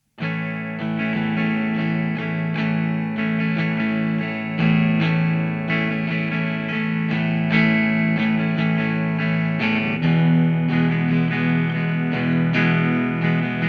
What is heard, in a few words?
FENDER TWIN REVERB